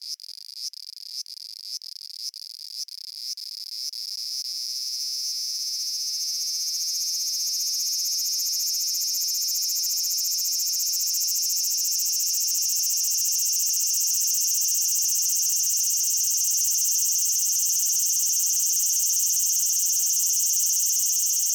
aburazemi cry

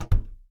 locker close

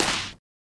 ball mitt catch

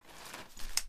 umbrella close

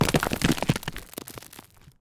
building collapse little

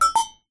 stupid

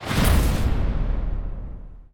text impact

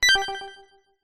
decision, button

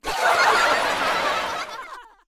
people studio laugh large

